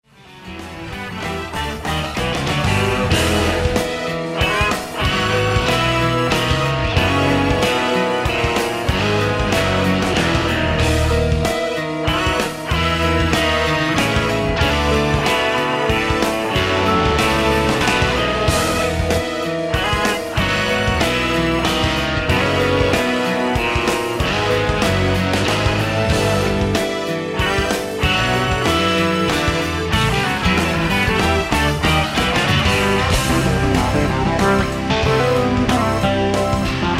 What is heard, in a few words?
Solo